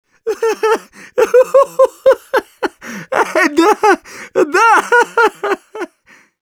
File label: laugh long; Scout